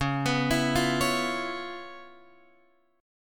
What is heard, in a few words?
C sharp 7th Sharp 9th C sharp Guitar Open F; arpeggiated; C#7#9; JGuitar